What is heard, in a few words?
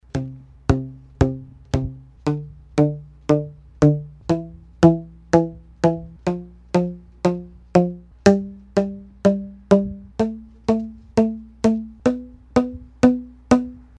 bass dia, boomwhacker